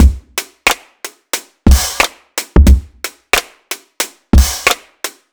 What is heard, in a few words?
Jungle Rimshot